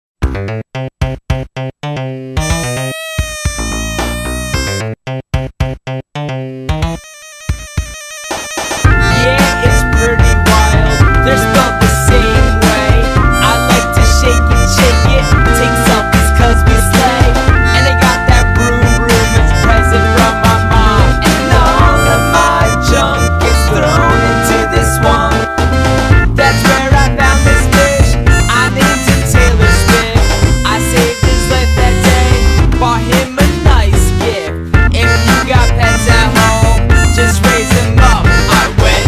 Hill Top Bass; Grandlovania

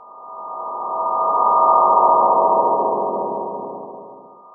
Ghost Shield Loop

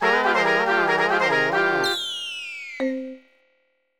Lose; tokimeki memorial forever with you; saturn

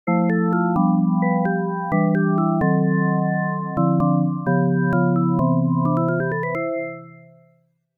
Logo (Arcade Version); Sammy

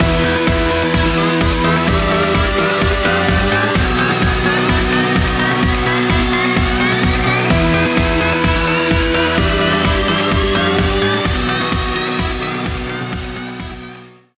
Stinger; Champions Field

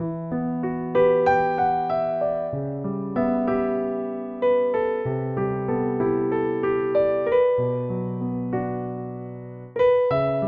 Piano ver.; 9-nine

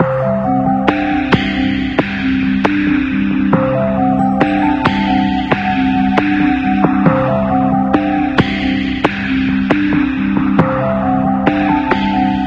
Test Failed; astrology ds the stars in your hands nds gamerip

